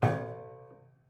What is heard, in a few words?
Prepared Piano